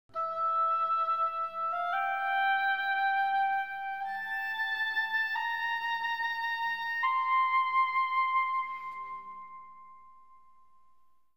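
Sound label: theme melody; Myst III Exile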